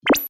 Beep Short, Scifi, Beep, Short, Electronic, Science, Fiction; BlastWave